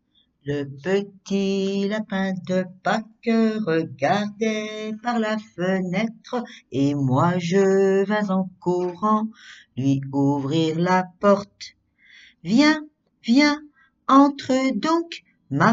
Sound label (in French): CHANSON PETIT LAPIN